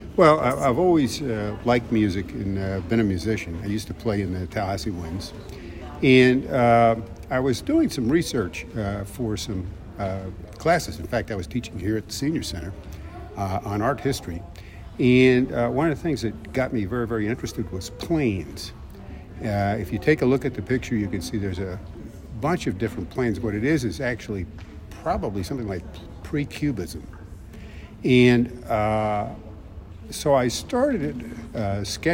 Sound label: Watercolor Trio